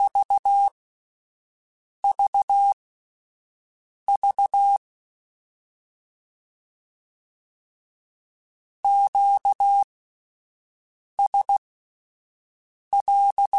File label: Q Calls Twice spaced, Morse Code; CW Code Groups; HamRadio